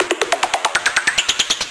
Fill 140 BPM

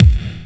Soft Kick; Vengeance Samples

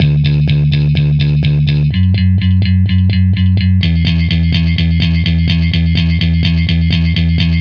Female E Bass, 126 BPM; Vengeance Samples; Vocal-Hook Kits; Song Kit

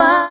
Vocal Oneshots; Vengeance Samples; Vocals

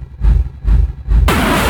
Loops Fills; Vengeance Samples

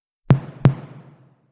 Melody Kits 128BPM Root C; Vengeance Samples

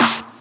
Snares; Vengeance essential club sounds-3

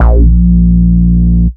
Root A#, Ultimate Bass; Vengeance Samples

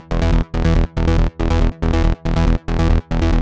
Bassline WET 140BPM, Eminor; Vengeance Samples; Selection Kit

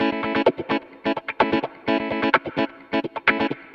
Electric Guitar Kit A maj, 128BPM; Vengeance Samples; Special Sounds

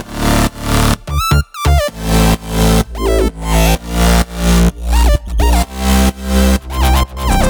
128BPM Melody Root D; Uprising; Vengeance Samples; Uprising Kit

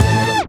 Synth Shot; Vengeance Samples

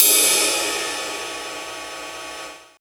Ride, Cymbals; Vengeance Samples

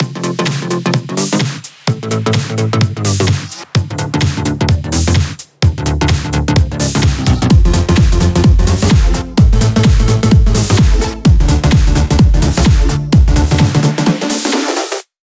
128BPM Mix; Vengeance Samples; VFH1 128BPM Australian Kit

